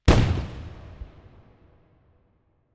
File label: firework new; spacemap